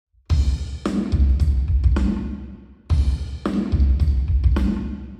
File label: vertical Drums 0to360deg